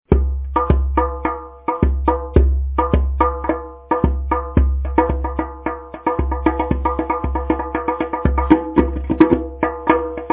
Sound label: zarb